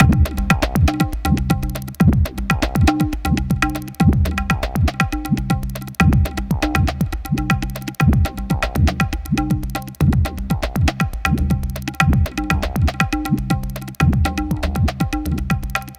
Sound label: Tablatron, Processed Percussion